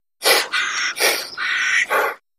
Chameleon Breaths

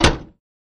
Car Door Slam Ext Hit S

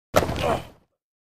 Football Blk Grunt, football

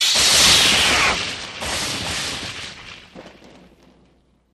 Hand Phaser Lightni; hand phasers

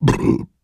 Deep Burps; burping

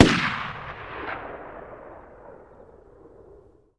Gun Shot Sngl Ext

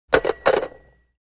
Phone Receiver Hang up

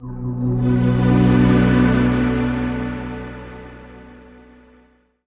Deep String Fade In, stinger

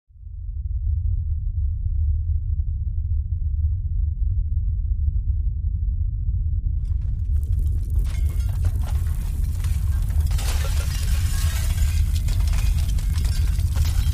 Earthquake Debris

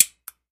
Lamp Switch Flip On

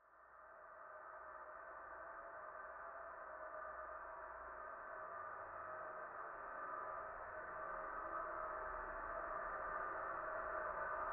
Horror atmosphere morphed screams

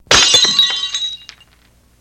Glass Crash Sml Bott; bottles

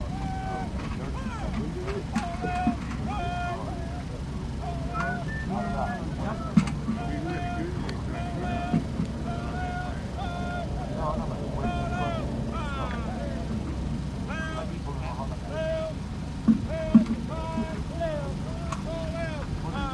Army Camp Background Troops, Army Camp Background; drills & commands

